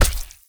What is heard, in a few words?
Raw Meat Impact; Warframe; Awakening Remaster